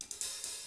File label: BOSSA, Drums